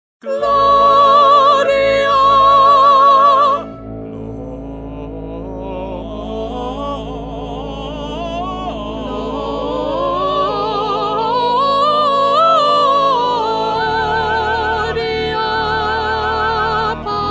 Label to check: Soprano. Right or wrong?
Right